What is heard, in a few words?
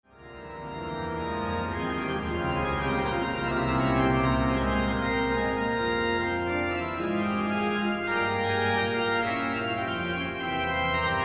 Fuga c moll, orgelbau; Bach; BWV 546